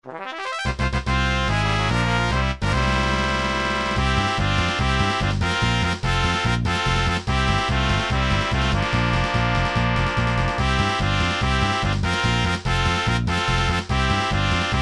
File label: fanfare; super nintendo final fantasy ii